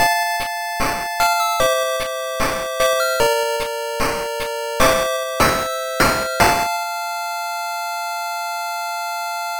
Game Over PSG; Thunder Blade; master system

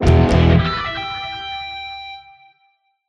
Level Up Sting; PWND

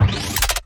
Charge Weapon

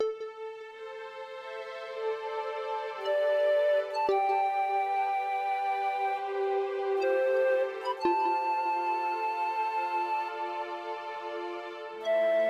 Lullaby; Dreadwood

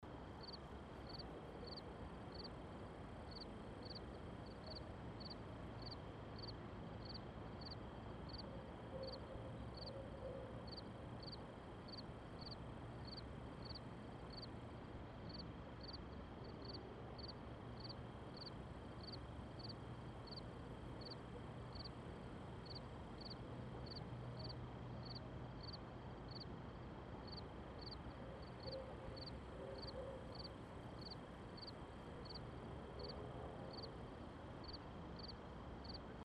Doorstep Ambience

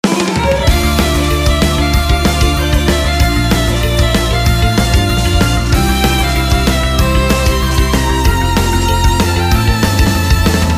sword of bravery loop; otome the exorcist

